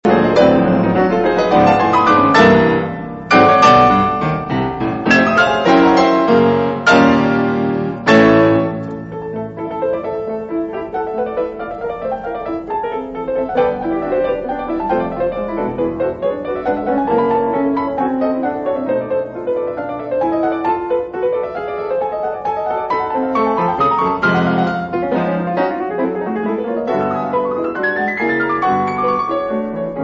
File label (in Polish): Koncert na 2 fortepiany Allegro